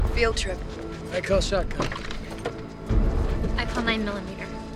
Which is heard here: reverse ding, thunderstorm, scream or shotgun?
shotgun